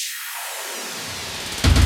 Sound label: Fills 128BPM, Clubsounds